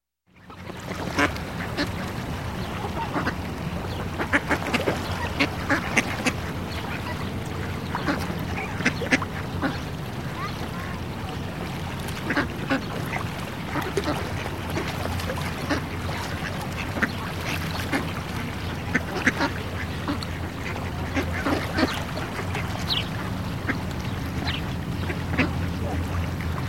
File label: Ducks Quack In City Pond; Sound Ideas; Series 2000